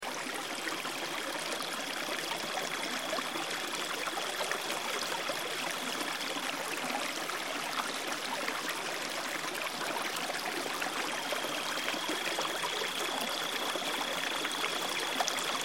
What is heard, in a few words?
Crickets And Water, Wilderness River